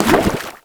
cast splash; Fate

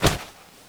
leather drop; Fate The Traitor Soul; ITEMS

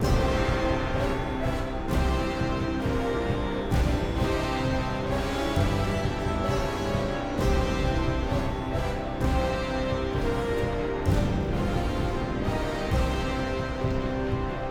dungeon stinger; Fate The Cursed King

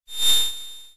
flash; Monster girl quest Part1,2,3; Visual Novels

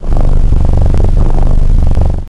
purr, cat; Fate The Cursed King; MONSTERS

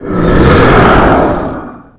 roar, spectre; Fate The Traitor Soul; MONSTERS

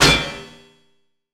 metal drop; Fate Undiscovered Realms; ITEMS

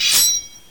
knife; Monster girl quest Part1,2,3; Visual Novels

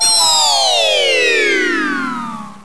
fall; Monster girl quest Part1,2,3; Visual Novels

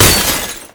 pot break; Fate The Cursed King; ITEMS

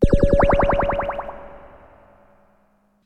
minimum; Monster girl quest Part1,2,3; Visual Novels

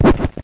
miss aqua; Monster girl quest Part1,2,3; Visual Novels

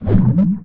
jelly swing, monsters, gel; Fate

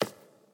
footsteps hewn; Fate The Cursed King

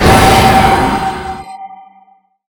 level up; Fate Undiscovered Realms

